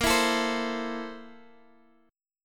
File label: A Minor Bb, Am/Bb chord; Am/Bb strummed; JGuitar